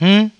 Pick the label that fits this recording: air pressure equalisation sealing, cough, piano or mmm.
mmm